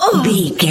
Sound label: voice female hurt pain mild